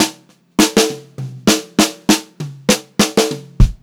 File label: FILL, Funk-Groove Drumloops; USB Soundscan vol.38; AKAI